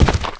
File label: footstep, zombie